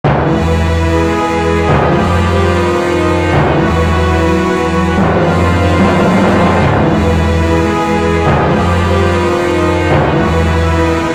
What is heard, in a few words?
Horror; blazblue cross tag battle